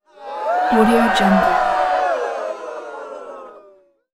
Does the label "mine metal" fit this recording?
No